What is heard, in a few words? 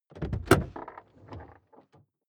car door open creak